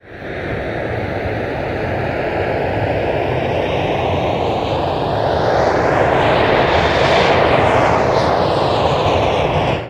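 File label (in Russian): шум летящего самолета в небе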